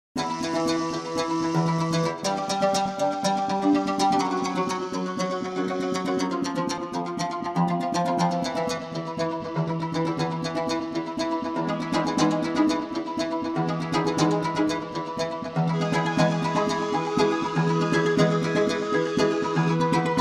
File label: World Instruments